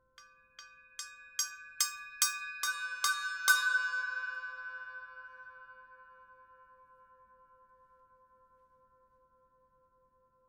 Blast Bell Crescendo, cymbal; FX Bells; 9.5" fx zil-bel, large

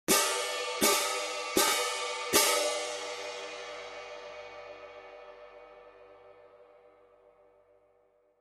16in Concert Stage Pairs

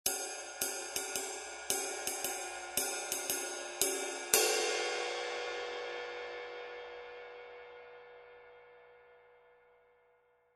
22in Custom Ride, cymbal

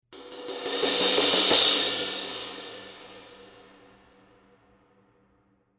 Special Dry Crash Build Crash, cymbal

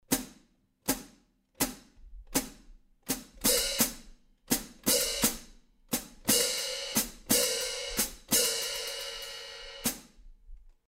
Foot Chicks, cymbal

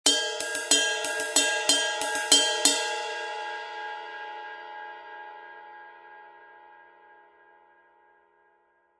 20in Custom Projection Ride, cymbal sound

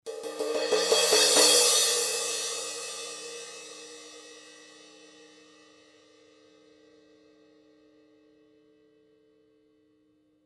S Rock Crash Build Crash, cymbal

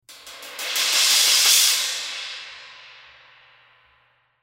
Custom Trash Crash Build Crash, cymbal

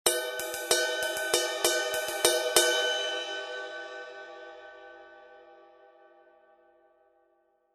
18in Crash Ride, cymbal sound

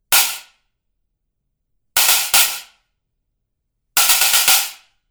Stacker Pair, cymbal

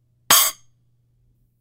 S Splash Choke Crash, cymbal sound

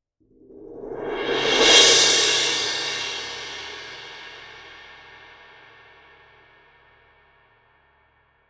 symphonic suspended 18 Small Swell, cymbal; K Klassisch Symphonic; オーケストラ用シンバル; K Klassisch Symphonic※は、繊細な音楽表現を大切にするアーティストがコンサート大ホールで演奏することを考えて開発された新しいオーケストラ用シンバルです

